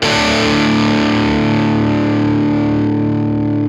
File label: GRUNG SUS4, Guitar